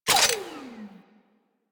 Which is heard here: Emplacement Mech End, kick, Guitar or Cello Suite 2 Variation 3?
Emplacement Mech End